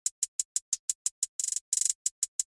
hihat trap; trap fl

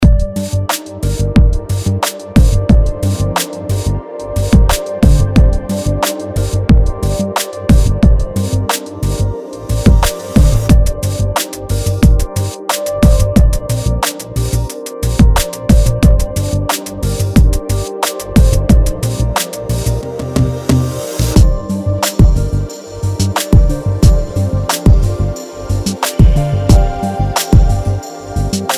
mega drums

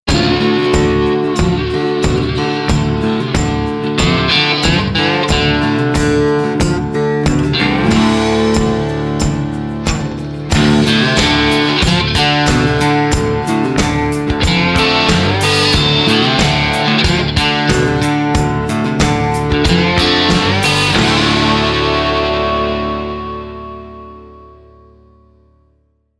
mids only; fab q2

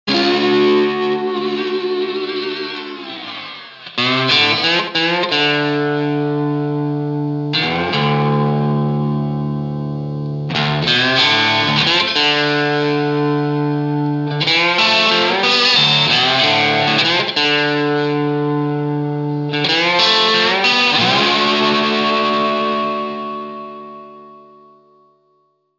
Slide echo no eq; fab q2

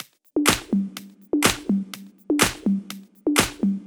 Drumloop

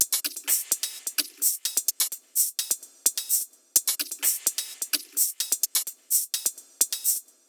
Percussion Loop 128BPM

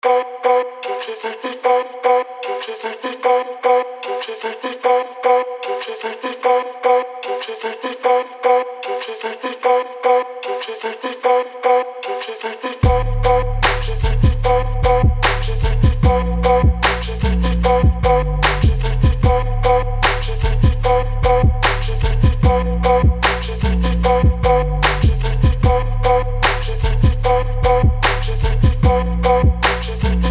trap kits; ghosthack sounds ultimate trap and hip hop

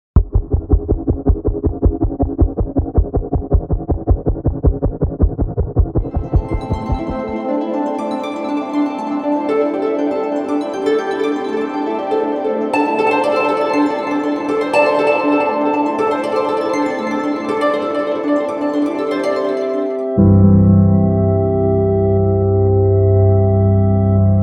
Cinematic Expansion; signal expantion

